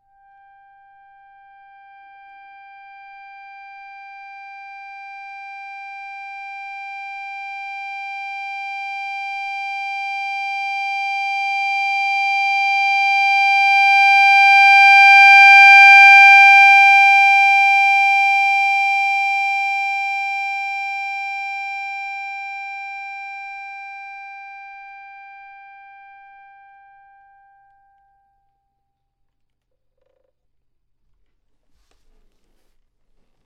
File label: timbre dynamic real